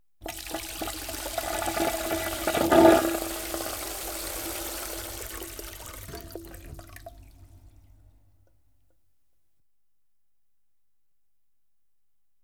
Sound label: Diesel Trains Toilet flushed; BBC Sound Effects Library